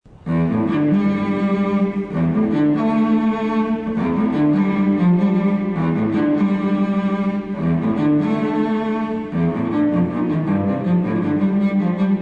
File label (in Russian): violonchel vzvolnovannaya